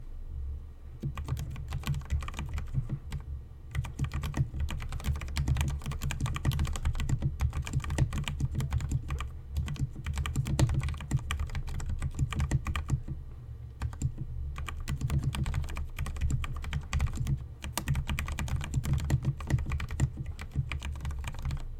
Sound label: Vissles V84 sound test